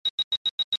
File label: nice msg tone